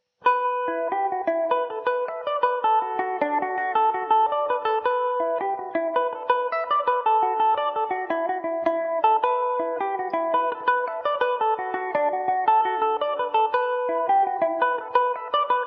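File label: E minor, Irish mandolin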